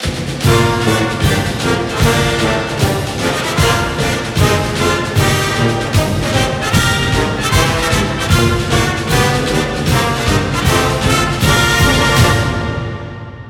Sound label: Broadcast Fight Song